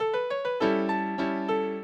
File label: minuet, mozart